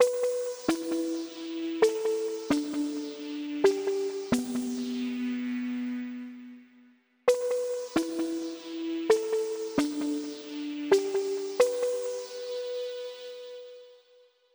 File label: Poppin Piano